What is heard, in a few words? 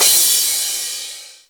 Cymbal Left